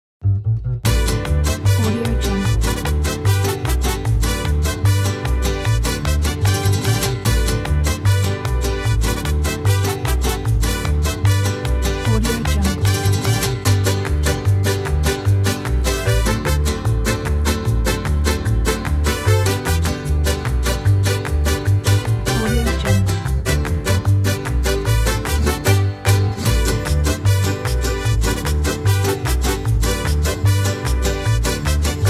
mexican fiesta; musicdog

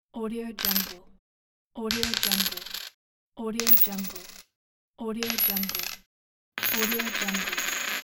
coins falling; dedgestudio

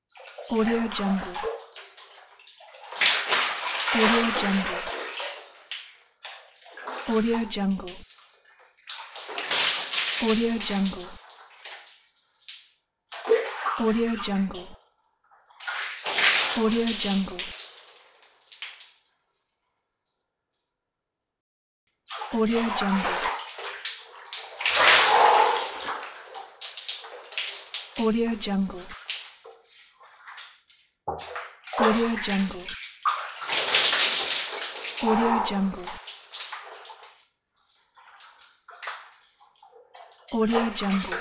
sauna bath

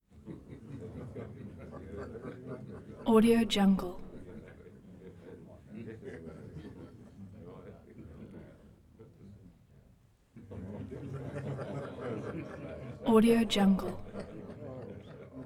group laugh

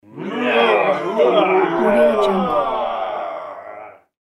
group of men disgust reaction

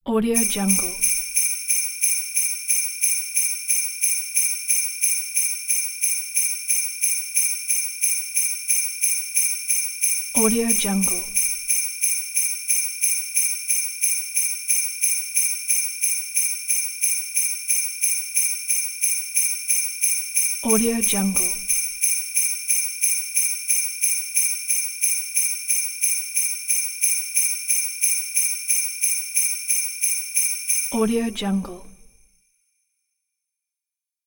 christmas elements

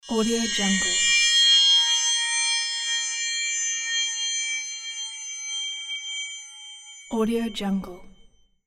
haunting metallic ring; floraphonic

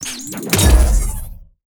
steampunk weapons uber god gun single shot; soundmorph

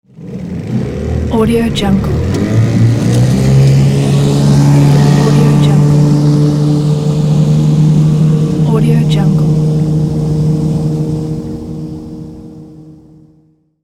boat

